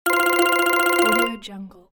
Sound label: ringtone; زنگ قدیمی تلفن همراه; interface sounds